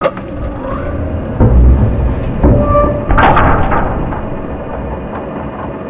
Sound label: bridge start